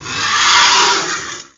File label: alert; svencoop; Hunter